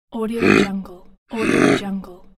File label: grumble